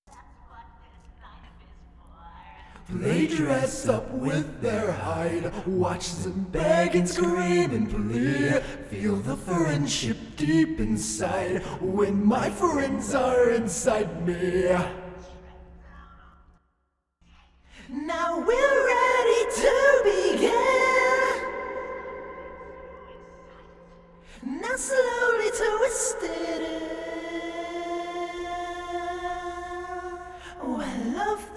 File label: Verse 3 Vocal; Secondary